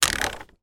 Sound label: car temperature dial move daewoo nexia automatic